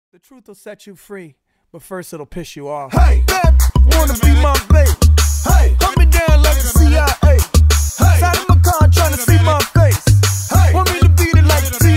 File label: MUSIC DUO HIP HOP